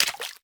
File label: splash, player steps; quake2